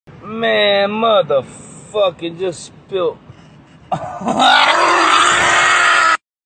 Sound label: man aghhh